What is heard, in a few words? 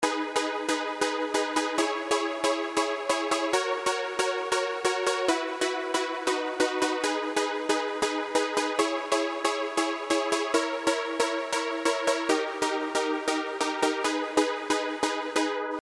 Syn Back